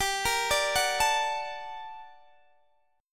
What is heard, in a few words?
G Minor Major 9th, Guitar, DADGAD